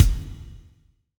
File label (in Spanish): BOMBO, percussion reverb; MARKYDRUMS